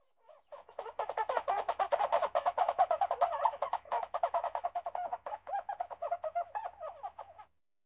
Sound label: Dolphin